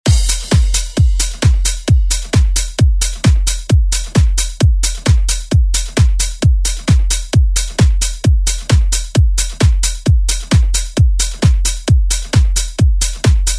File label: 90s Re Drum; DJ Mhark